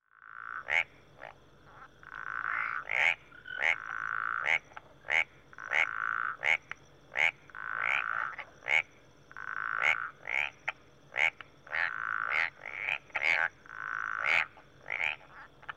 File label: frogs and insects jungle